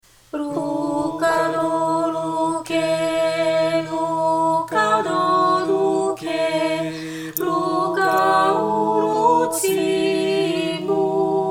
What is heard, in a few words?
Kolo tutti